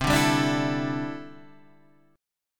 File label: D 7th Suspended 2nd Suspended 4th C